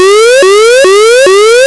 Alert

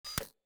lock Opening

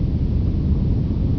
fire rumble

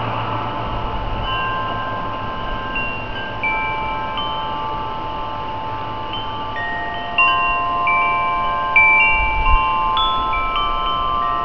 alter chimes

